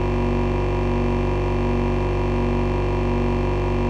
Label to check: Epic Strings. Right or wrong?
Wrong